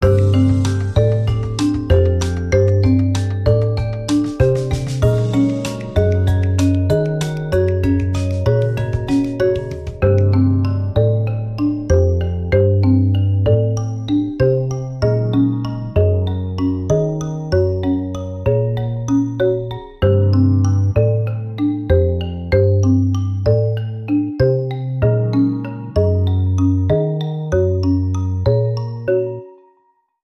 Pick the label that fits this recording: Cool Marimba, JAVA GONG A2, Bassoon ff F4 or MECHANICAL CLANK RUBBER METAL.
Cool Marimba